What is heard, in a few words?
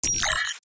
Phase Plant